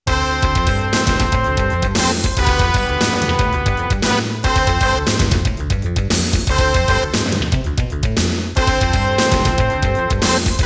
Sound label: Winning Demo (SC-55); Fatal Fury 2; x68000